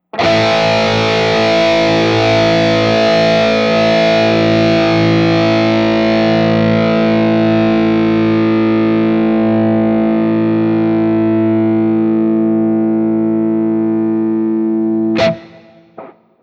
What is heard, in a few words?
Guild S285 Sustain; guitar